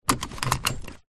vehicles car hyundai trajet seat belt click lock; 2006

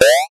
multimedia game bounce jump

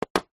chalk stick thick set down on small chalk board; office

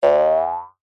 cartoon spring boing jump jaw harp slow ascend

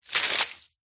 nature water pour onto concrete

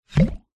cartoon pop medium; zapsplat

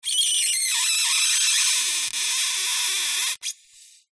vibrations cello bow styrofoam cooler long; Sound Spark

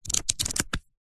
household book childrens glossy pages flick

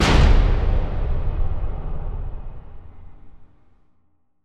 Impact Boom Flanged; bottlerocket

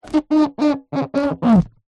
leisure toy inflatable hammer rub squeak

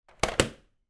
household vhs video cassette down on table

